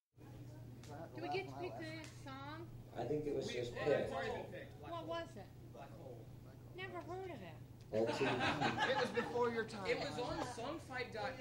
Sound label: crowd